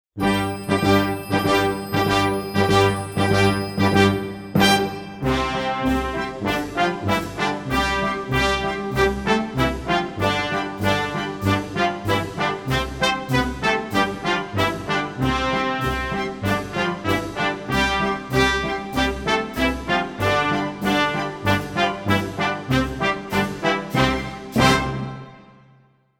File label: Can Can fast, recorder; recorder karate